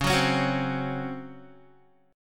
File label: Db Major 7th Suspended 4th Db down Guitar; strummed